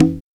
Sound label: CONGA; drum; PERCUSSION